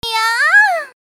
nyaaa; torchlight

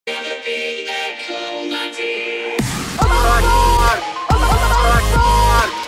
Allahu Akbar Dubstep; torchlight

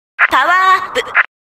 powerup; torchlight

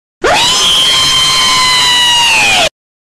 REEEEEEEEE; torchlight

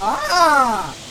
goat; torchlight

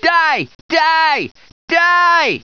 die; torchlight; hl1; otis

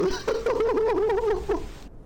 laugh; torchlight

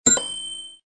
coin; torchlight

